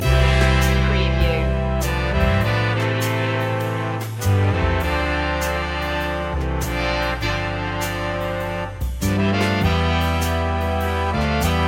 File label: big band romance loop